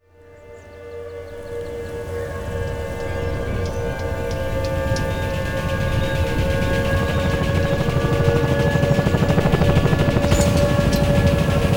Resort Music Loading; Battlefield 4

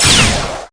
Weapons Slinky shot

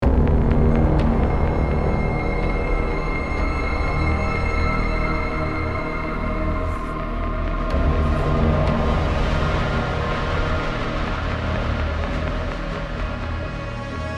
Hangar Music Ambient

